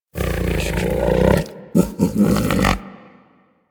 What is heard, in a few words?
Predasite Vocal Snore; Warframe; Hunting Critters